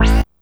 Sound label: Synth Hit A